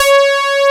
OBX SAWS C#5; E-MU Formula 4000 Series Vol. 5; Protozoa; Vintage Presets

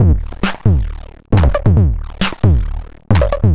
Drums Strange

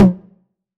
909 Tom Sweet

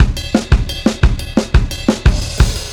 Bon Bon Kit 1-Bar Fill, Drum n Bass; KJ Sawka - Drum n Bass & Beyond; Beats and Percussion

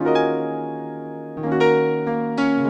Piano, Acoustic Pianos

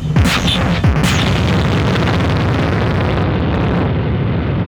CYBERGUN L, Hard & Loud Techno

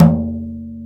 SHEKO, ETHNIC PERCUSION; AKAI S6000 CD-ROM - Volume 5

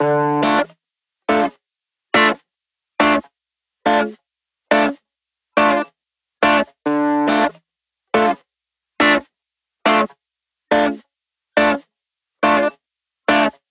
140BPM Dmin Guitar DRY, Offbeat Dub Guitars; Antidote Advent

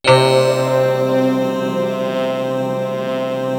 PHARAOH, KORG; East West - Global Sounds; WS KORG#2

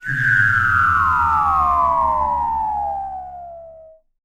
WHISTLZ, HUMAN SYN; ILIO; Vocal Planet VOL-3; Jazz & FX

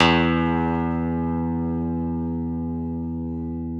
PNO, Electrics, Grands

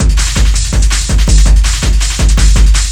LOOP, Eurotech; Sounds Good

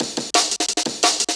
175, Breaks; EZRollers

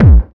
BD, BD Dance

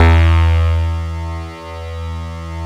PERC BAS; Synclavier Sampler Library; KEYS AND GUITARS

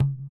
THROAT; Spectrasonics; Metamorphosis; THROATERS

